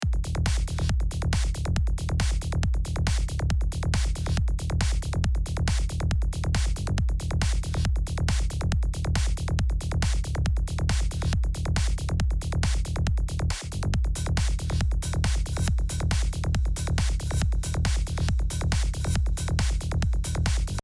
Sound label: Groovy Baseline